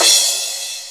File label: RY30 Crash; Drums; Yamaha RY30